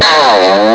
guitare; ST-XX Amiga Soundtracker Sample Packs

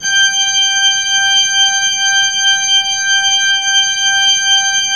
Orgue Eglise Full G6, Pipe Organ Full

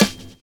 LA CLOCHE; Univers Sons

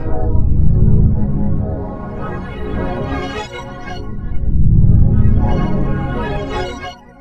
ORGANE; USB Soundscan vol.33; AKAI; Disjointed Textures

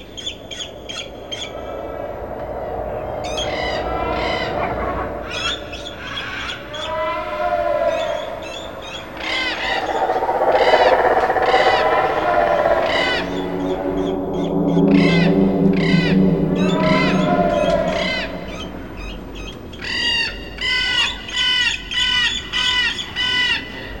AMB FORET; USB Soundscan vol.02; Underground Hip Hop; AKAI; AMB.FX

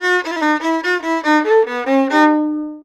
VIOLON, ArabianTraditions; USB Soundscan vol.43; AKAI; VIOLRIFF1

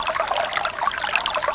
RUISSEAU; East West - Global Sounds; SC-55 FXS

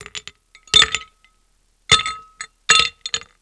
glacon, BAR AND PUB; East West; Global Sounds

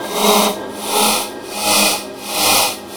PONCEUSE; USB Soundscan vol.33; AKAI; Disjointed Textures; TECHNICS

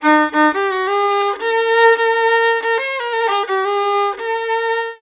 VIOLON, Arabian Traditions; USB Soundscan vol.43; AKAI

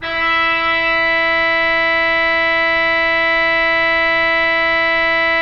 Orgue Eglise Reeds E5, Pipe Organ Reeds

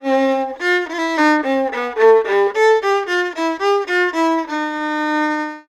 VIOLON, ArabianTraditions

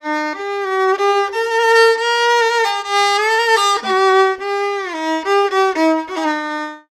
VIOLON, ArabianTraditions; USB Soundscan vol.43; AKAI

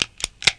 357 AVANT, REVOLVER; East West - Global Sounds

